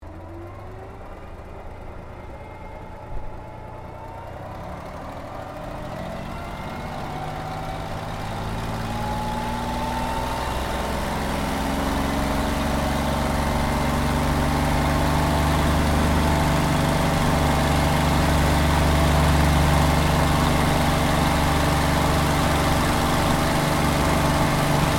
Aircraft P-40 Kittyhawk takeoff Interior, WW2 Kittyhawk or Warhawk fighter plane; 1940s; Airplanes